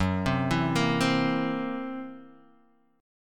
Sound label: Gb 7th Flat 5th Gb down Guitar Half Step Down